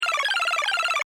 Video Game Sequence Chaotic Vintage Eight Bit Loop; Technology